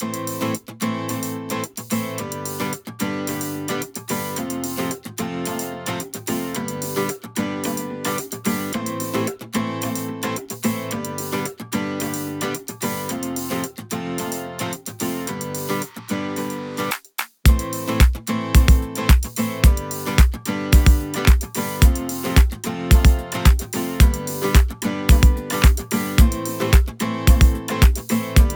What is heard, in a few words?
Pop 110 BPM F maj